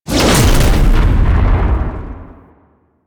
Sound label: Whip Ranged Attack Proj Explosion; Enemies; Weapons; Warframe; The New War; Archon; Nira